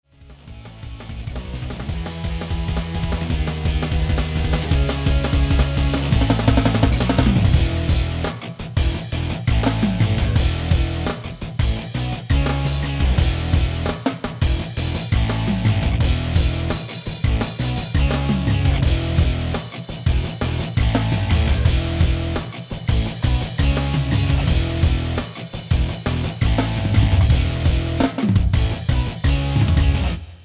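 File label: TD9 Kit, Roland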